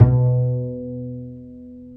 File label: Bass, Roland